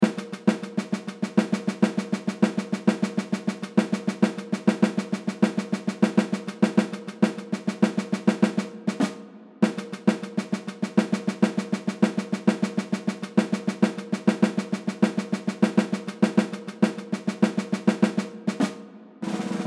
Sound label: add a pop fast